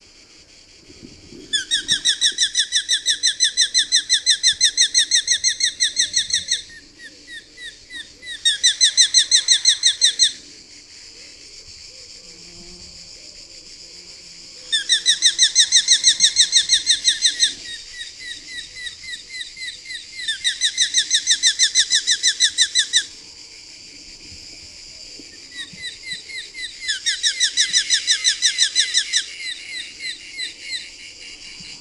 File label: Eurasian Hobby Falco subbuteo